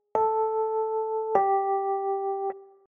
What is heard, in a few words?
Tone Down